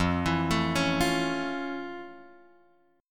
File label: F Augmented Major 7th F; F+M7 Chord {1 X 2 2 2 1} arpeggiated